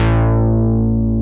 MOOG F1, bass; AKAI S6000 CD-ROM - Ultimate

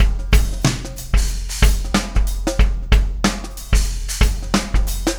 Beat, Loops

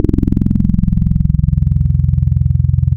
FMPlucker, wavetables; Waves Codex

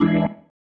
Stab One Shots, Organ

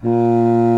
BASSOON A#2, REEDS; Greytsounds VOL-1

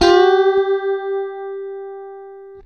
STRAT GT

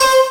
Dance Mega Synth; Best Service; 90 s Sample CDs

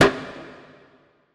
perc organic hollow

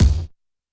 BD Break; YAMAHA RM1X

